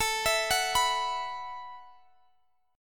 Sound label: B Suspended 4th A; Bsus4/A; arpeggiated